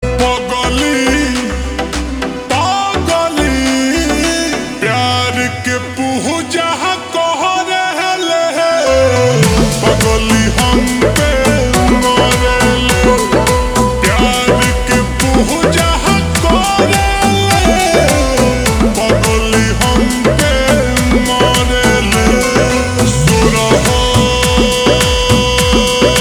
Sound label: Ringtone slowed and reverb